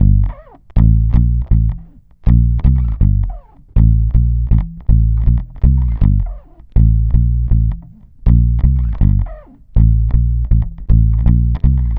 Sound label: Dub Bass, Electric Bass